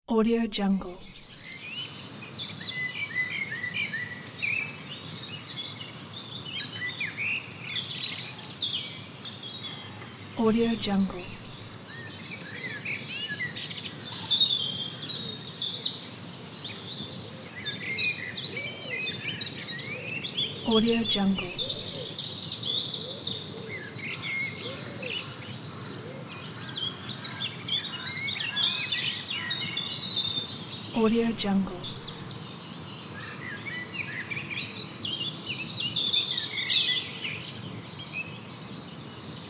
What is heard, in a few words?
nature sounds